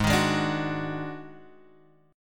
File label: D sharp Minor Major 7th Double Flat 5th G sharp